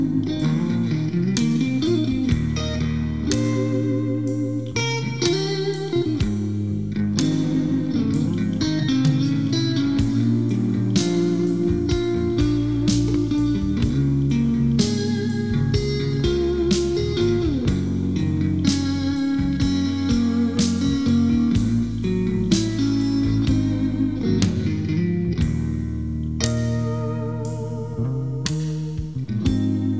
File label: blues